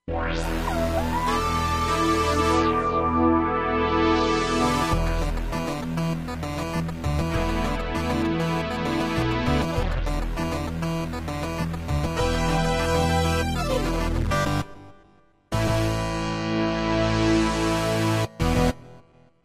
ARP 2600

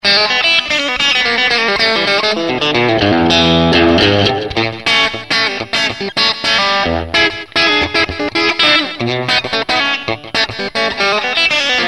Fender Bassman

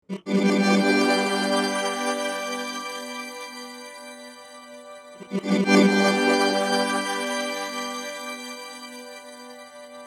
Smooth Crystals

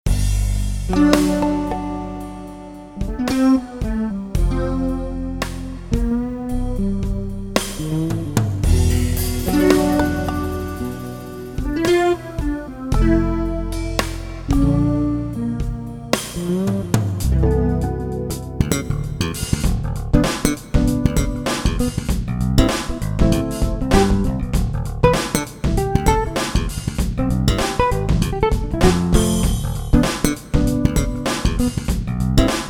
Bass

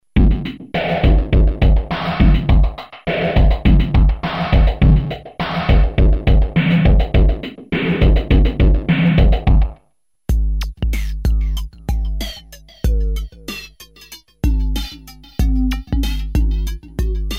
processed beats

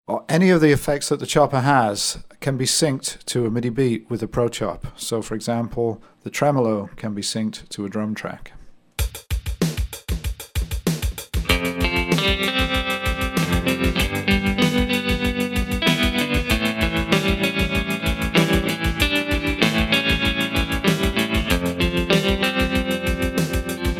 Trem Sync

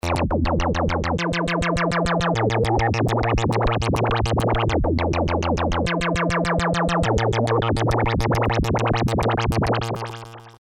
cycler mod

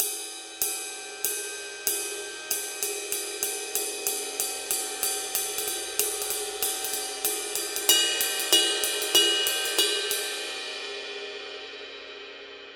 ride pattern

